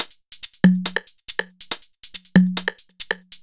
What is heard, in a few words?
hat, Drum loops